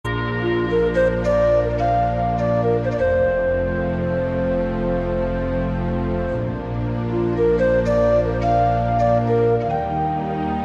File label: morning flute